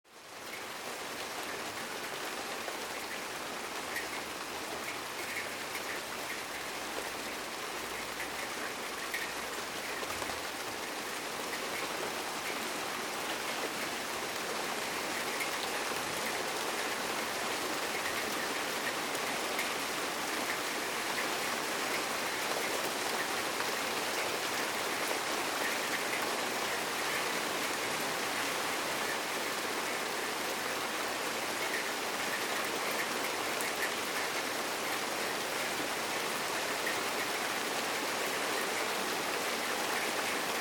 Suburban ambience rainy day

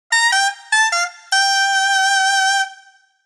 Sitcom accent trumpet jazz

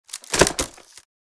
Hamper set down soft impact; basket

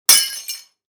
Tea cup smash glass impact

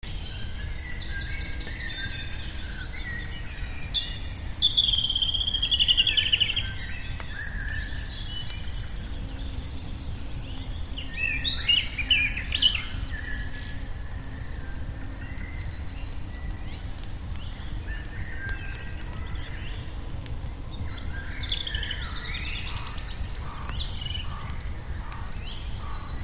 Forest campfire crackling ambience

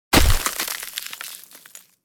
Impact of ice block being smashed

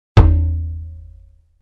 Empty plastic container impact single hit

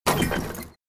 Chain basketball net

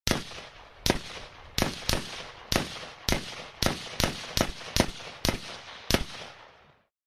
M16 firing distant perspective

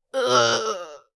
Adult female moan in pain

